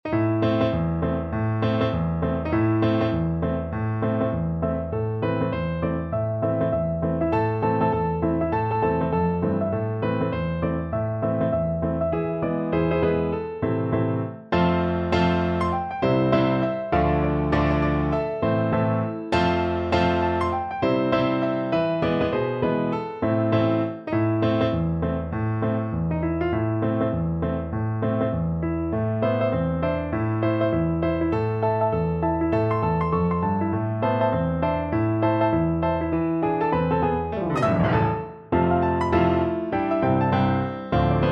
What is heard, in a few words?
wellerman, piano duet